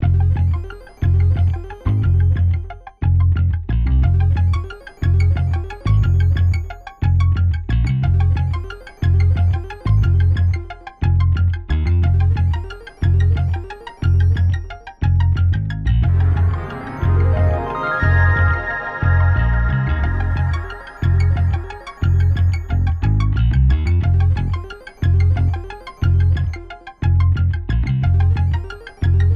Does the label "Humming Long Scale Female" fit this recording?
No